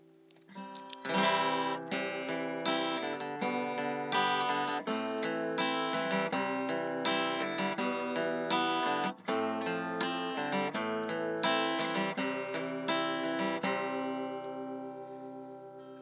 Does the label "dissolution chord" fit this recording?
Yes